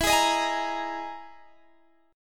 E Major 7th Flat 5th E down Guitar Half Step Down

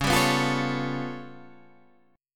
G 9th Flat 5th C sharp

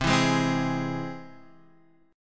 C Augmented down Guitar Half Step Down

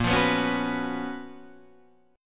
Gb Major 11th

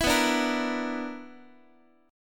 B-Minor Major 7th Double Flat 5th, Guitar; BmM7bb5 strummed; JGuitar

